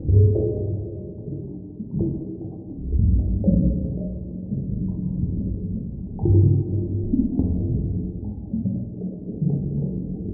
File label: underwater